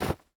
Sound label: snow land